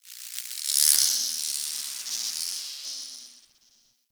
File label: Insect Close; Warframe; Eidolon Plains; Ambience